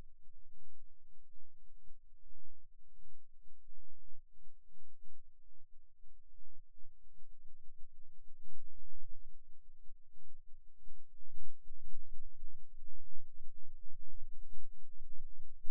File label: Rhythm Seizure, eeg